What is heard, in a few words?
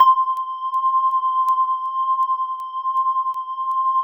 C5, SynthLine; 90 s Sample CDs; NiceBeats; SynthLine VOL-20